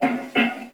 Wolf Breath; Animals; Duviri

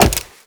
Wall Jump; Footsteps; WallRun; Warframe; Characters; Tenno

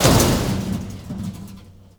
Destructible Metal Barrel; Ambience; Gameplay; Warframe; Nineteen Ninety Nine

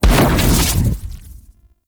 Rifle Alt Projectile Dissolve, Weapons; Warframe; Tenno; Gyre

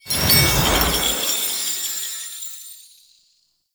Offering Generating; Warframe; Cetus; Cetus Invasion

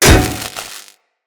Electro Prod Hit; Weapons

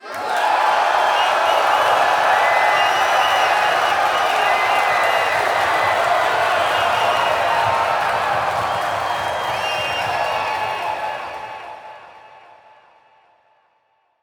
Game Start Cheer, Crowd; Lunaro

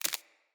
Root Spider Step Dirt, Foley; Animals

